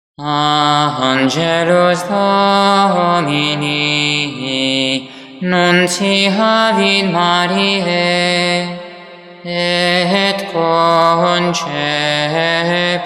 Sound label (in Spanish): Angelus Domini Sin aleluya; Initium Sancti Rosarii; santo rosario; misterios gozosos